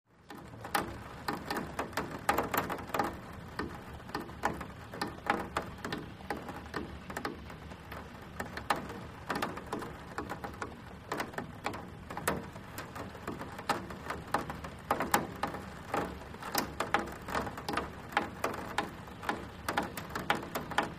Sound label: Close Rain Drops On Metal Air Conditioner Outside Window. Loud Metal Splats And Water Dribbles. Distant Wet Traffic In Street Below.